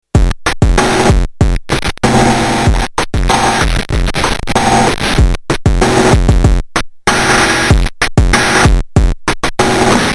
Distortion Beat; Deformer

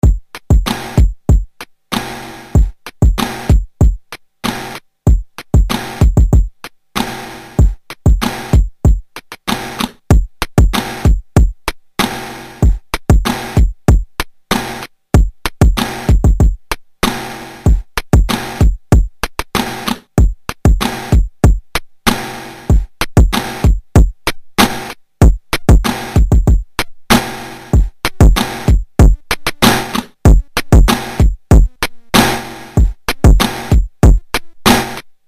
Compressor Beat; Deformer

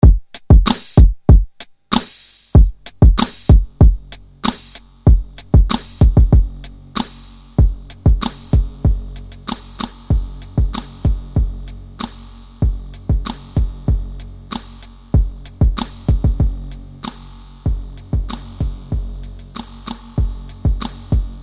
Short Delay; Deformer

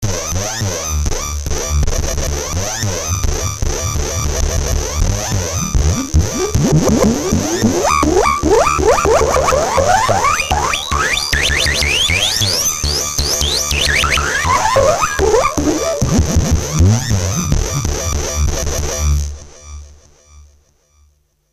Glitch; Anamono

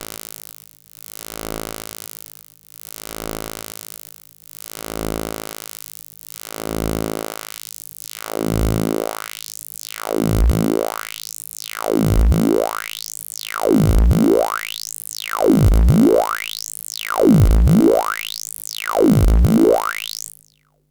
CS HPF; Eurorack

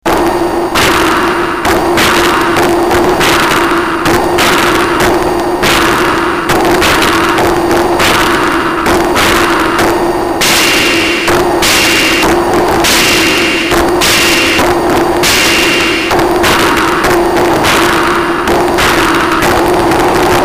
lofi clap; Anamono

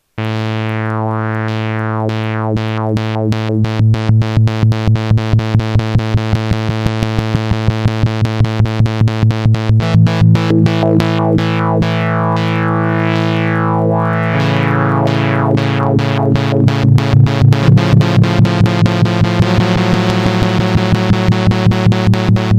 lfo wave rate mod; deMOON

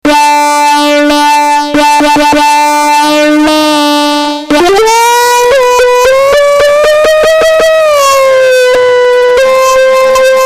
Dirty lead; Anamono

